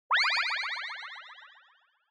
Motion Blow Away Delay Down

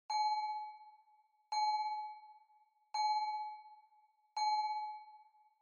Fire Truck Siren Fake Reverb Bell

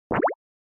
Anime Motion Dry

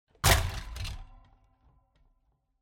Electric Fan Knock Over

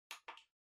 PC Operating Noise Far Button

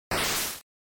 NES General Noise Phrase

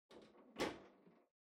Air Conditioner (Far Panel Close)

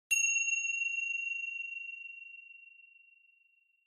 Finger Cymbals Short

